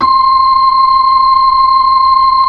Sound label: B-3 Hollow; Xfade; Keyboards of The 60's and 70's